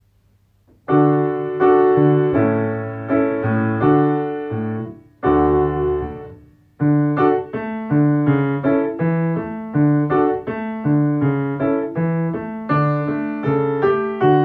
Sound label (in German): Klavier